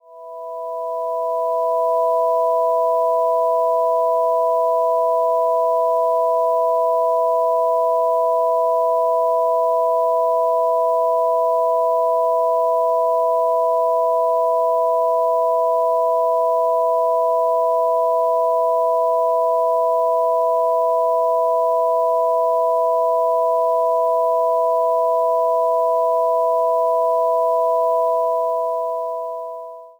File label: victory theme; novafarma